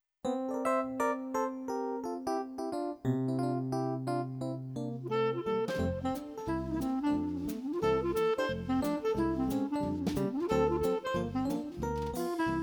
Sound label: Swing; Live Band; Hotlanta Dixieland Jazz